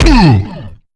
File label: fall; quake2; coop; quakeguy